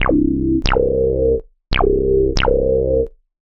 ELECTRO BASS 140 A

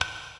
BOTTLE, Ethno

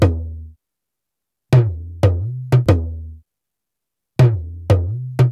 ETHNO EXTRA 90; ethno2

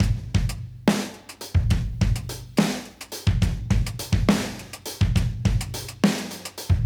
Modern Breaks; Touch Loops; ClassicBreakSessions

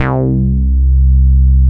BASS MONO

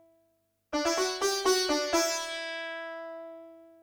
ethno, 125, A

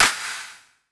clap snare; Anthemic

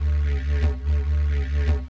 ethno, 125

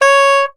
Soprano Sax Portato C#4; Orchestral; DirectWave; Varazdin Orchestral

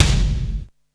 BD, bass drum; BASSDRUMS; BD CHOICE

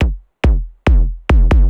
KICK TECHNO 140, Techno Drum; Techno2

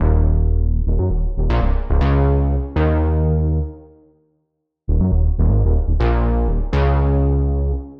120 F# Squelcher, Bass Loops; Touch Loops; BlissedOutBeats

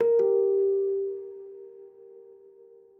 ethno, 160, C

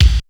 BD GATE; drum; BASSDRUMS

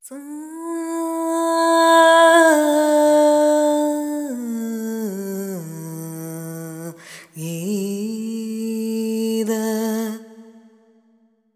ETHNO FEMALE, Vocals; ethno2

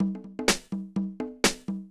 DEEPHOUSE BEATS 125

